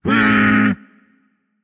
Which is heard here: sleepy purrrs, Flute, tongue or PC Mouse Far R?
tongue